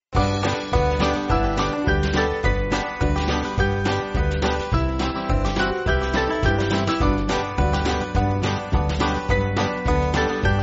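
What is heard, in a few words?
Band, Backing Files for Churches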